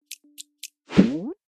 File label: round speedup